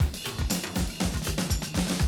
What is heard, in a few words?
120, Classic Drum Loops